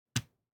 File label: DICE on Felt, Drop, Standard, Two Dice; Samples; ArticulatedSounds; DICE Sfx Party (Extended)